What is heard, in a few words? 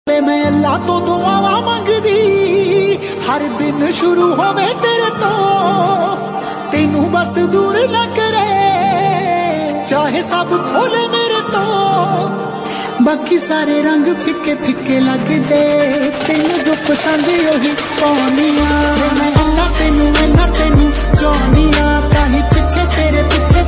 Punjabi Song Ringtone